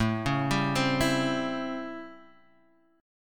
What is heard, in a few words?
A 7th Sharp 9th, Guitar, Half Step Down